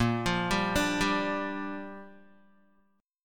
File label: Bb 6th Bb, Guitar; arpeggiated; 6,8,5,7,7,x